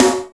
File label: Snare AMS, Snares; Roland L-CDX-01; SNR Snares 3; SNR Sn Modules 3